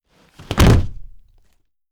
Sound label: BF; Fairlight Sound Lib